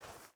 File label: footstep burlap sand walk; Rust